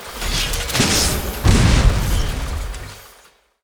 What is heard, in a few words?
robotkid atk, monster